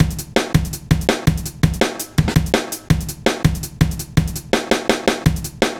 Heavy Live, Jungle, Drum Loops 165.5; Zero-G Jungle Warfare 1, 2 & 3

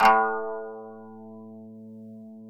KANUN A2; SoundBytes; Early Patches; KANUN SMAL

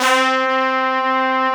STAB BR, SC55 BRASS

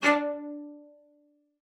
D4, CELLO SPICC; Xsample VOL-5

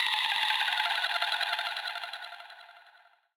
GTR DRIP FX, Gbm; Rawcutz - Nu Forms

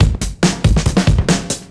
Beat Style, 140bpm, breaks

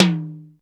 DRY T, drum; Crypton; DrumBase5000

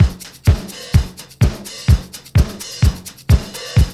BEAT, Roots Disco; USB Soundscan vol.32; AKAI

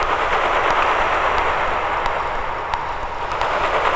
Shakin Drops, Noise Percussion; Sonic Foundry (Sony Creative Software); Ma Ja Le Ethereal Textures

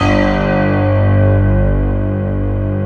piano pad G1

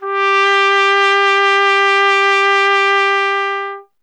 TP G; Miroslav Vitous; Solo Instruments VOL-1

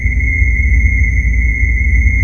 SPACE ATMO, WEIRD SPACE; Zero-G Ambient 1

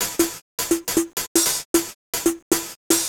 155 Hats, Drum Loops; Zero-G; Soundclash Nu Dance Electro; Acidized Wavs; 155bpm; Left of Center

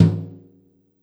A2 Brush Tom 3, Yamaha PSR-E323 Brush Kit

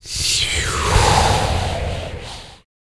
VOX FX, BREATH FX; Spectrasonics; Vocal Planet; Jazz FX

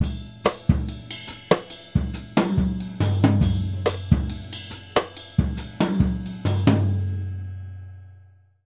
Jazzistic; Univers Sons

